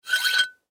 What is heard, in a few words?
Pipe Insert Slide; Machinery; Foley